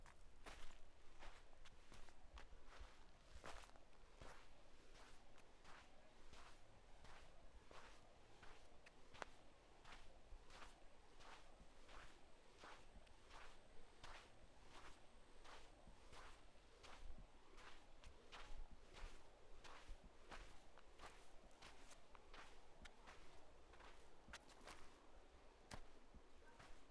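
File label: Sand footsteps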